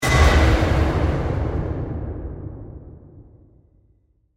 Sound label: Hit To Explosion; Imaging Element; Accent; Transition; Production Element